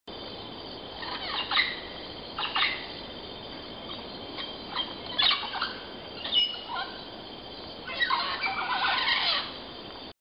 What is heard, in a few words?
Parrots; panama